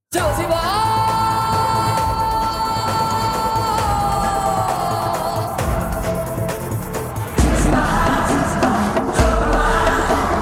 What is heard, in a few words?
Trailer BGM Mix; Akhanda 2